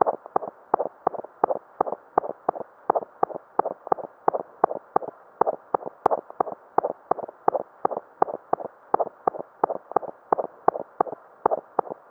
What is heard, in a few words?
Auscultation